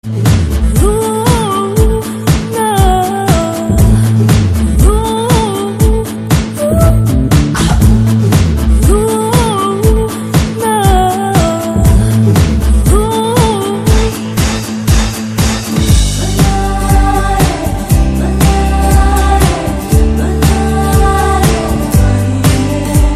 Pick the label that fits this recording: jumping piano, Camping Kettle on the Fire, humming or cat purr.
humming